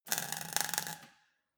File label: weld sparkle short